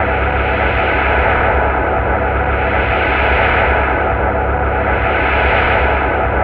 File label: HUMM DRUM, ATMOS HUM; Spectrasonic Distorted Reality 1